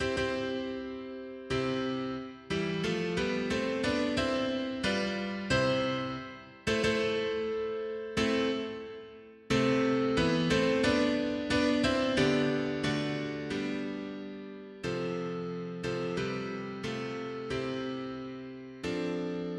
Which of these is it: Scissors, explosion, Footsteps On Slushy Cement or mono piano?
mono piano